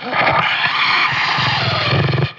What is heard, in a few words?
Raptor breath